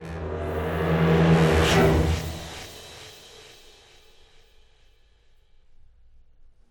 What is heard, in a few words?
End Sting; Gac Action